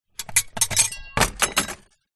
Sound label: impact glass shards small drop into container break; zapsplat